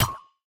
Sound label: Shield Shot; MiSide